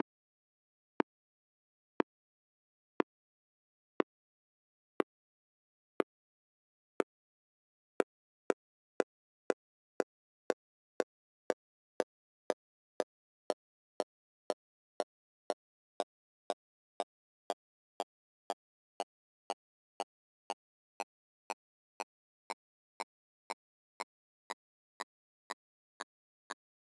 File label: Click